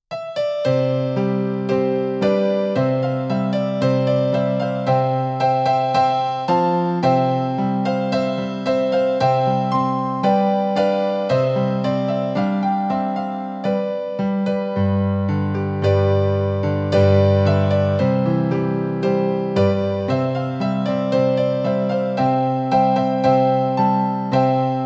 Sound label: Baroque Fanfare